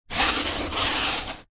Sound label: foam board hand fingers rub; zapsplat